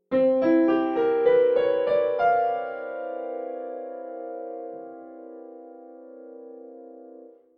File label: post tonal harmony